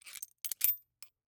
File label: latch locker aluminum link jostle slide click out over; sonniss gdc